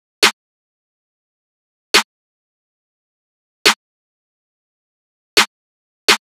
Riddim Drum Loop 140 Snare; Ghosthack; Riddim Essentials Volume 2; Full Drum Loops

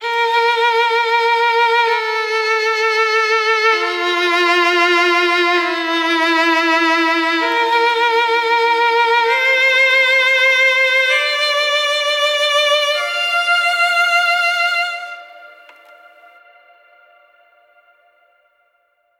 Violins - Dm 130Bpm; Construction Kits; STEMS; Ghosthack; SkyRealm; Kit 24 - Dragon Mountain

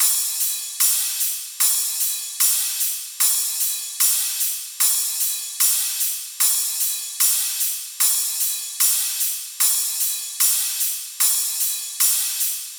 Hihat Loop - 150BPM; Dubstep; Drums; Loops - Top; Raptor

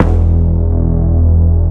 Melody Shot E, dubstep; One-Shots; Melodies; Glitter; Ghosthack; Dubstep Sounds 2025

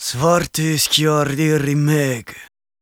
Battle Cry, Viking Vocal, Un-Processed; Battle Cries & Phrases; Ghosthack; SkyRealm; Viking Vocal Builder

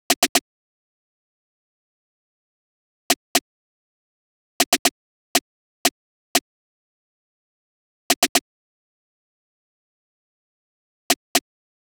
Slow Crunch Drum Loop 120 BPM Snare; Cymatics; Mystery Pack Vol 4

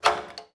fx scrape

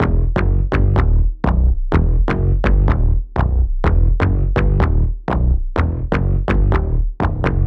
Bass Em 125Bpm, Techno; Bass Loops; Driveby; Ghosthack Advent Calendar - Day 6 - Techno Kit

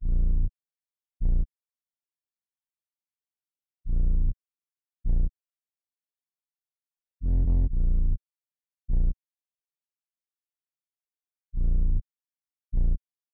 125 BPM A# Min Bass; Melody Loops; Loop Stems; Cymatics; Antidote

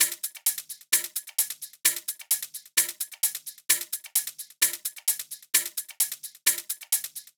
Top Loop 130 Shake Top Loop; Drums & Percs; Percussion Loops; Ghosthack

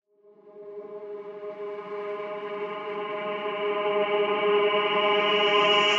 Reverse Vocal - G#; Vocal FX; Cymatics; Euphoria Vocal Sample Pack

